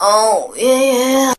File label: "oh yeah" sung